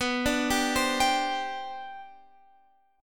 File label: G Major Add 11th, B; Gadd11/B; arpeggiated